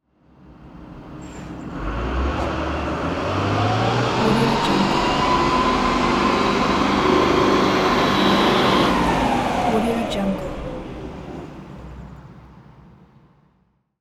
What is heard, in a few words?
truck drive away